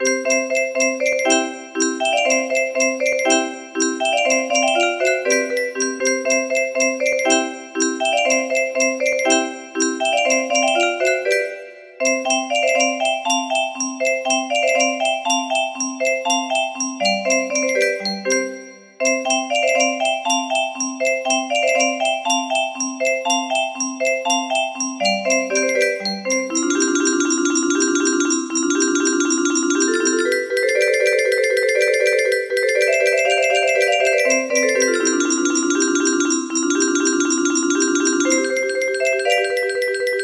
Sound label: melody, music box